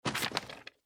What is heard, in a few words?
UI Letter